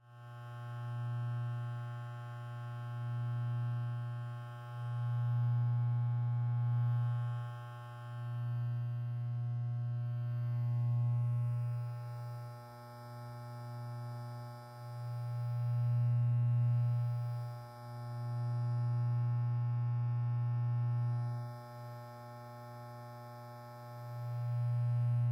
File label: ASMR, loop, buzzing, clippers, hum, moving, bare, extended, mono; Eiravaein Sound